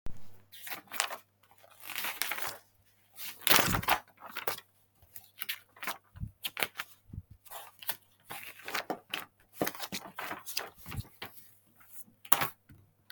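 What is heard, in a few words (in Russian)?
это звук перелистывания страниц который я издавал с журналом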